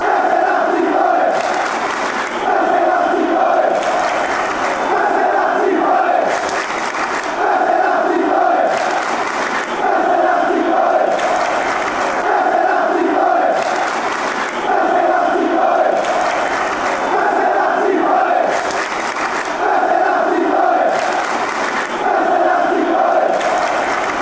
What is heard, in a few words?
ole, fanchants